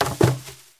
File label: Plastic Container Drop Trash; SFX Kit; Foley - Misc